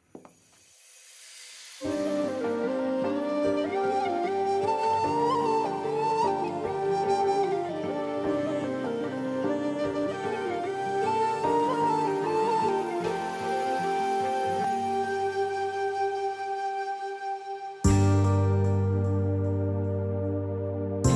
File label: Karaoke, 75 BPM; BasserMusic Studios